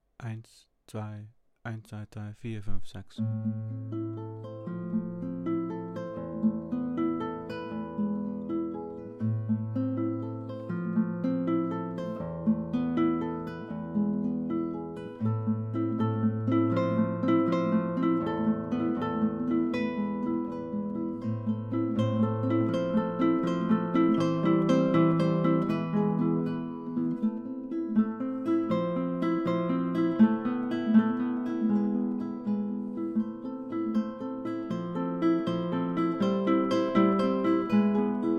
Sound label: Trance